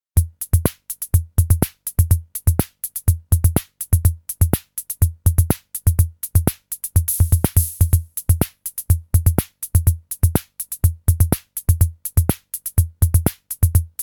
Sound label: SR-88 PATTERN